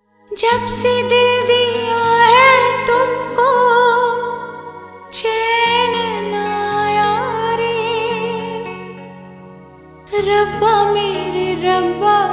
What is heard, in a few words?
Sad Version